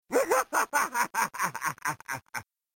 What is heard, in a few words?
laugh; zombies